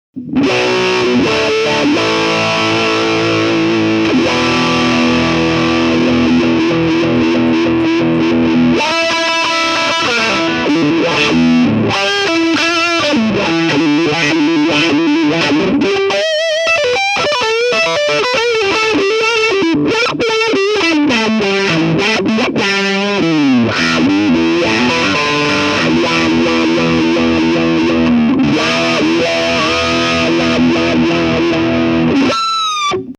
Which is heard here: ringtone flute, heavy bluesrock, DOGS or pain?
heavy bluesrock